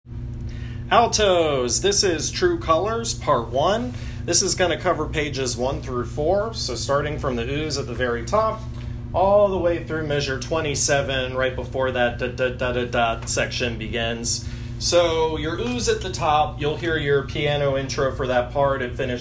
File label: Alto